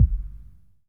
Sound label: kick